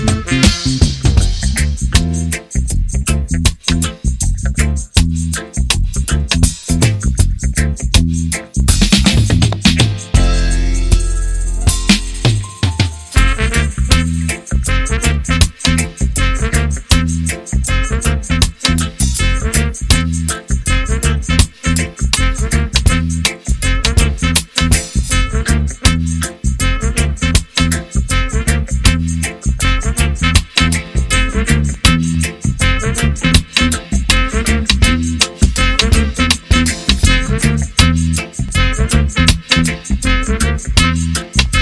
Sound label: Bluesy, Jazzy Kinda Dub